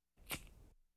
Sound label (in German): feuerzeug; Alltagsgeraeusche